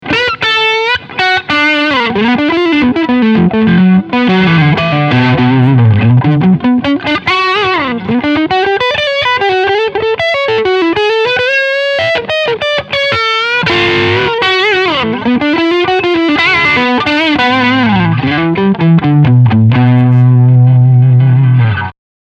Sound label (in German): Crunchsound Sololinie